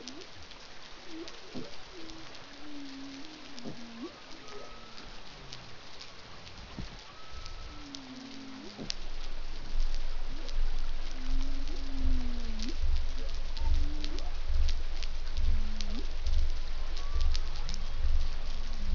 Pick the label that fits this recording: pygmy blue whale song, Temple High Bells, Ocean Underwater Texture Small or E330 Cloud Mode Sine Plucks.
pygmy blue whale song